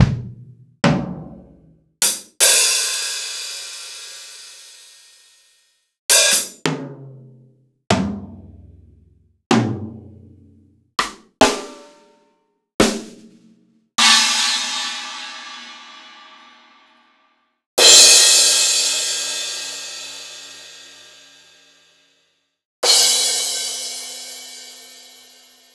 Akai MPC2500 Acoustic kit

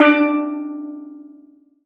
Steel Drum D3

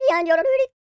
YODEL; ILIO; Vocal Planet

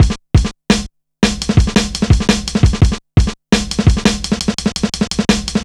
170, Drum Loops, Drum & Bass

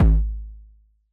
BD Jungle, Yamaha DJX 2B

